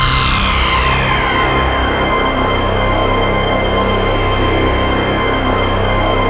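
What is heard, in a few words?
ATM HORROR, Production Effects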